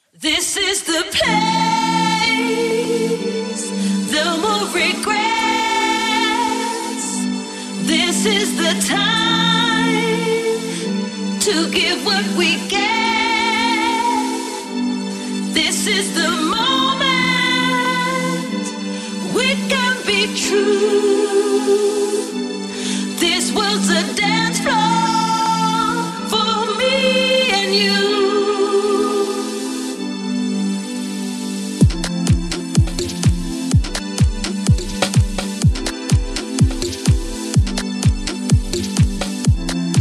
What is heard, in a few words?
disco music 432 Hz